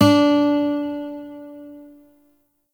Shawzin Oct Two D; Warframe; Lotus Shawzin

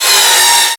Miter Metal Impact; Tenno; Hit Effects

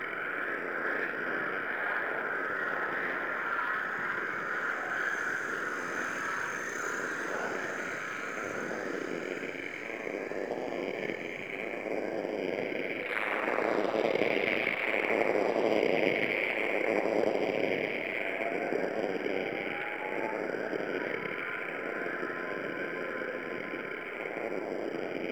Mountain Pass Sculpture Loop; Ambience; Oro Sculpture; Warframe; The War Within

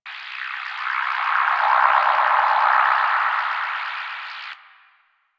Dog Tag Rare Loop; Warframe; Syndicates; Dog Tags

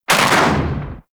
Briefcase Launcher Shot, Weapons; Warframe; Corpus

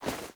Wisp Movement Foley; Warframe

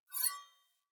Sword Whoosh Accent Long; Weapons; Player; Swords; Fey Sword

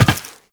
Land Glass, Footsteps; Warframe; Tenno

